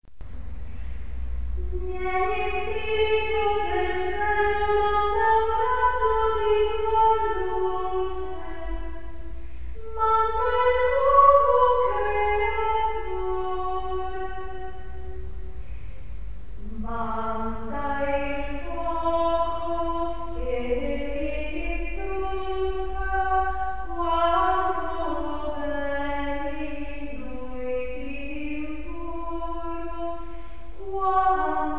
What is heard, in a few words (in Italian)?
Contralti